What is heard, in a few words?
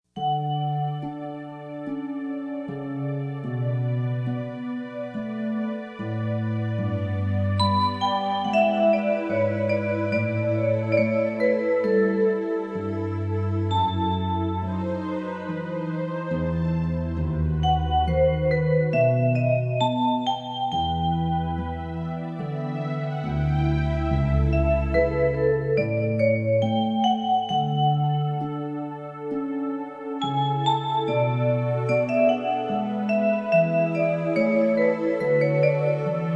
Music Box Orchestra, Orchestral Suite